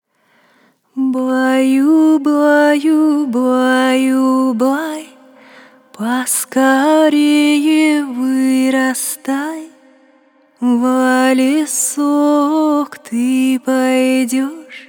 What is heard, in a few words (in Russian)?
Русские народные колыбельные, Поскорее вырастай